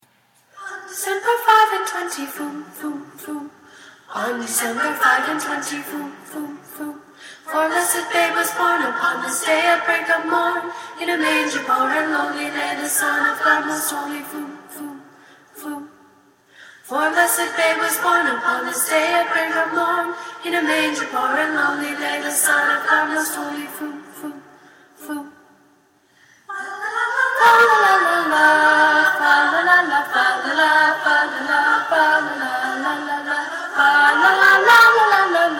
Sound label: alto